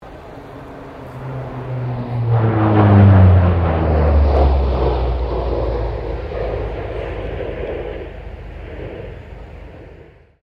AP-3C Orion pass; RAAF Orion; Airplanes; Vehicles

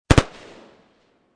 M16 2 Bursts Bridge; Assault Rifle; Assault Rifles; Guns; VRSonic

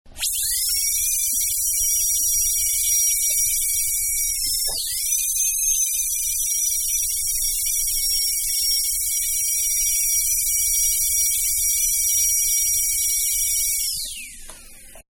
Dentist-Drill; Drills (Sound Effects:Tools); Manhattan Production Music

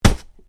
PUNCH; Various Organic Foley sounds; Punches and Hits (Sound Effects:Combat); SoundEffects-Foundation4

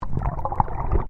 Underwater Movement Swoosh; Submerged impacts created by dropping a weighted rope into the ocean; Splash Sound Effects

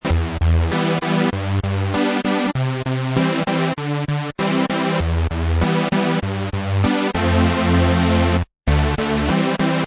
Saw Synth Em 98

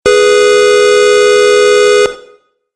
Car Horn Very Long; Car Sound Effects; vehicle sound effects

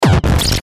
Glitch Hit Warble; Production Element Imaging Element Accent Transition

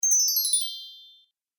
Magic Spell Gliss; Magic Sound Effects

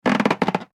balloon rubbing squeak; Balloon Being Rubbed With Hands - Good Cartoon Stretching / Expanding Sound; Funny Sounds; Comedy; McKinney Sound

